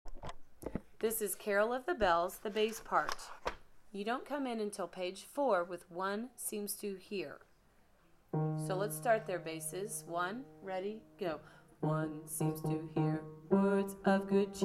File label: carol of the bells bass